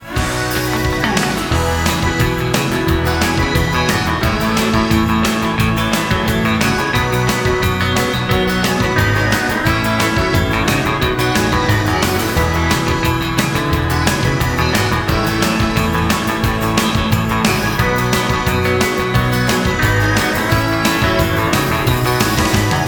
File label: Schecter tele